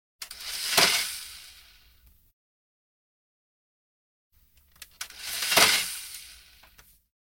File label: Franking; 100 sound effects